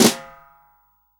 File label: SWING SNARE CHROMATIC, Real Drum Kit; REAL DRUMS